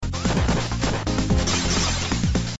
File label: phone kick audio